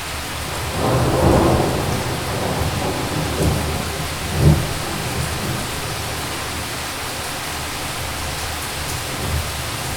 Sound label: mountain thunder roll with heavy rain; soundscapes